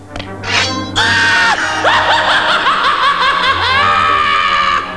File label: Maniacal laugh; ren&stimpy